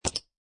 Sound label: Poker Chips Dropping On Playing Cards; Poker, gambling, chip; Card Sound Effects